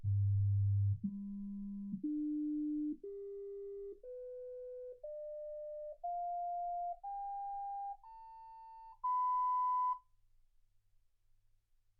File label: sinus tones 48kHz